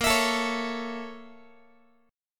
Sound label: A sharp Minor Major 7th Flat 5th A sharp Guitar; A#mM7b5 strummed; JGuitar